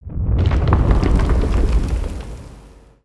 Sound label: Earth Quake Rumble Rock Slide; ninjago